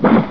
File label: caster, weapons; predator